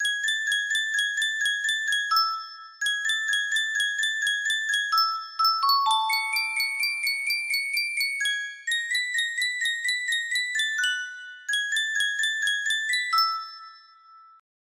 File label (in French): Yunsheng boite a musique